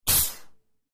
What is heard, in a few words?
Small Air Brake